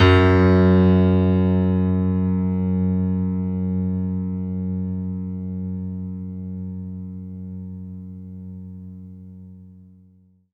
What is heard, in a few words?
F#2, Synth Line; 90 s Sample CDs; NiceBeats; SynthLine VOL-22